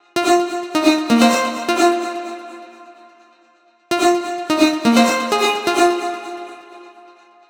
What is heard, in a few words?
Melodic Loop Fmin 128, Melodic Techno; Vessel; Ghosthack; AC2024 - Day 12 - Melodic Techno